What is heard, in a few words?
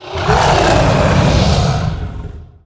Death; Dragon Spirit